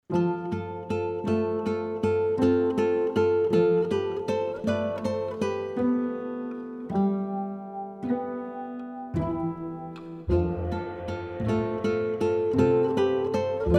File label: guitars